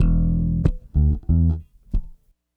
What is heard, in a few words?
bass, analog funk; Mixed Berry Jam; Sonic Foundry (Sony Creative Software) - What it Is! 70s Analog Funk